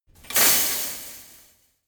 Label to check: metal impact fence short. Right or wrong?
Right